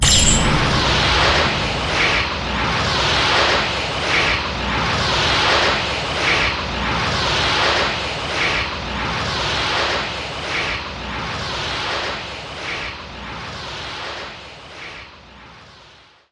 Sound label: Reserve Loop